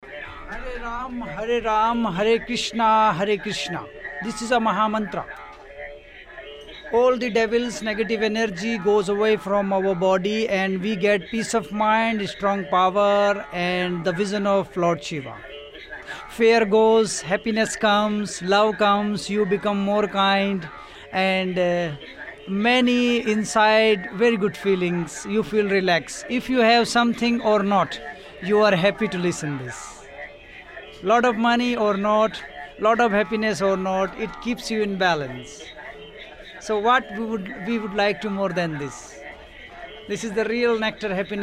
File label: harekrishna